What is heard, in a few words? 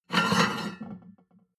plate on plate take slide medium